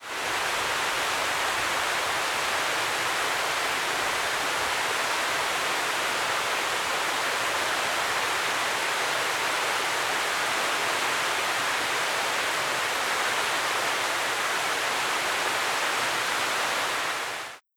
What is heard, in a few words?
Hoover dam Fall; Hooverdam1 Fall2